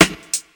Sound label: short reverb acoustic snare sound g sharp